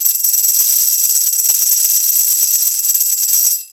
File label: Roots Reggae; Sounds Good - Roots Reggae